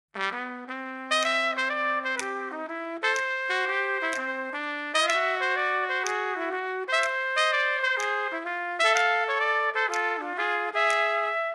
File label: Jazz Duet